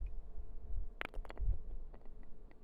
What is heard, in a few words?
Rock On Concrete